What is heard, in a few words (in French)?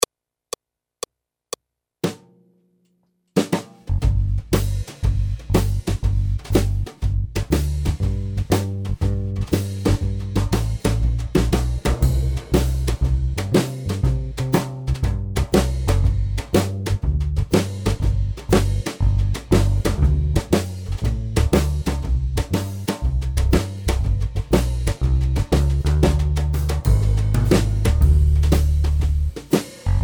Blues'n boogie Accompagnement seul; Jazz